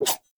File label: Dagger Whoosh Heavy; Weapons; Enemy; Daggers; Sinecure Dagger